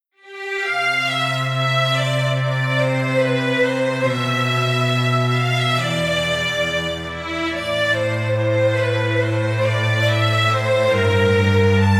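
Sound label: Strings Polyphony Bass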